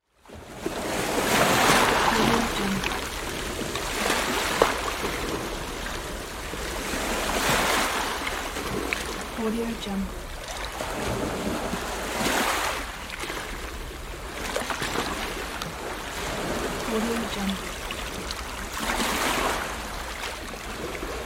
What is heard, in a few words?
sea